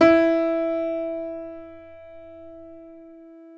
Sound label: PNO, KEYS; Synclavier Sampler Library